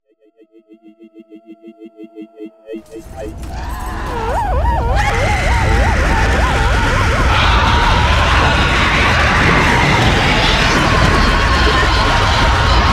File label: scream